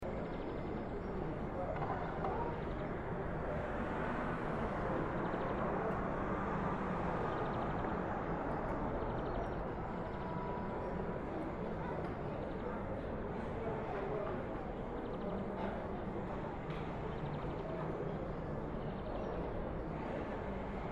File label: hippopotamus